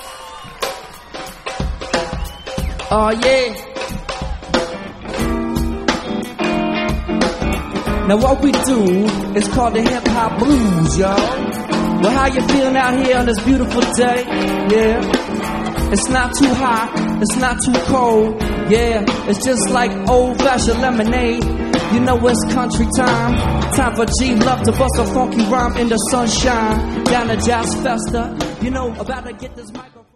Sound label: glove jazz